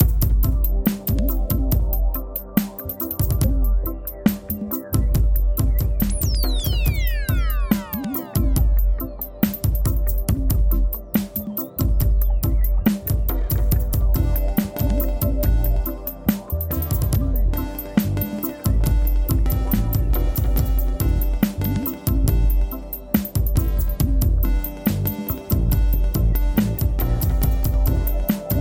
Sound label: beta waves